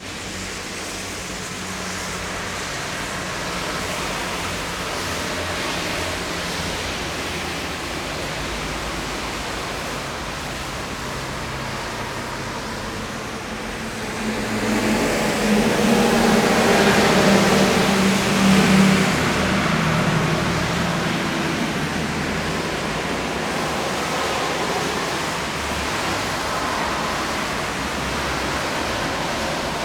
highway amb, street traffic; best service Blue Box

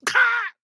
town human road hit; Blind Drive

